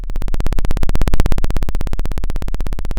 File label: Sound of a chainsaw